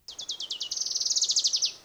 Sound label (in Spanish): canto rmariposera, Reina Mariposera de Puerto Rico